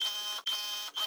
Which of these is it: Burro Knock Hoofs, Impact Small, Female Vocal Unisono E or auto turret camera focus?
auto turret camera focus